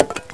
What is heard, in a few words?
bones; mk2